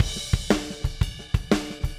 Drums, Rock

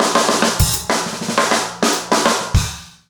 basic funk 100 fill

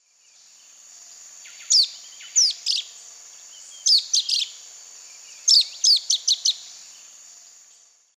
Myiozetetes similis, call; S. America; Eastern Lowlands

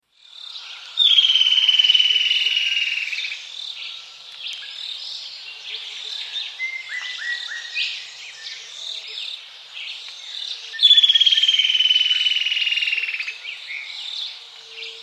Streak-Headed Woodcreeper, S. America

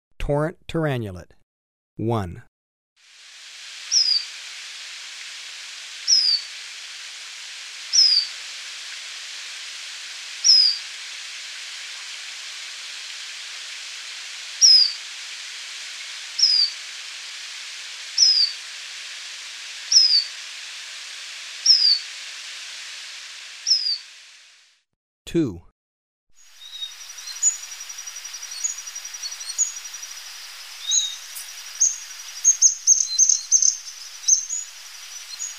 Tyrannulet, Birds of Ecuador; S. America

